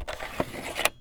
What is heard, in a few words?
Smooth Texture; BB Retail Therapy Sample Pack